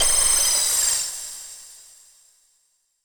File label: SPACE-FX